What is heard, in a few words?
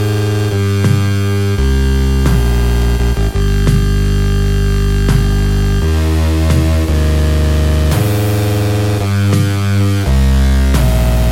eprom drone